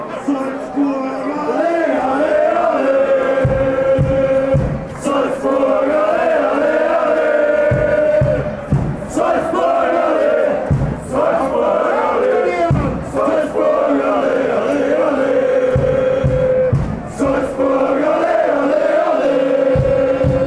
salzburg ole ole ole, fan chants

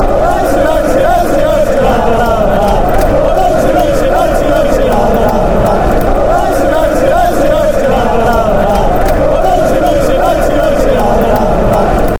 bouncy, fan chants

